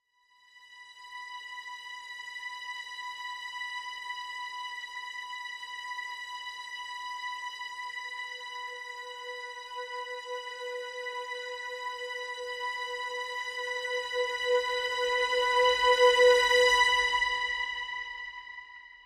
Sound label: Simple String (B)